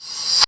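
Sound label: TR909 Crash Reverse, Drumset; Roland SH-32; Drumset 04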